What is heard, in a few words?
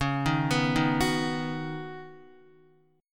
Db 7th Suspended 2nd Suspended 4th, Guitar, Db7sus2sus4 chord; JGuitar